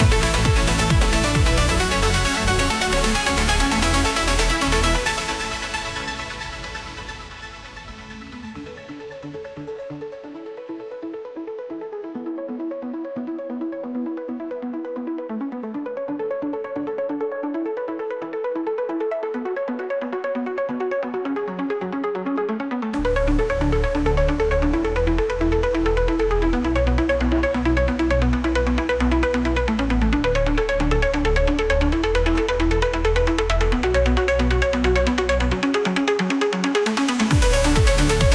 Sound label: TechnoBIT